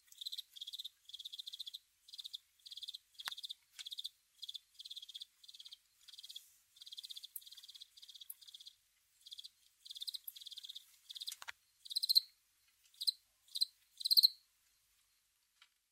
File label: house cricket